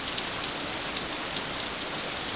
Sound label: rain heavy; efx; giants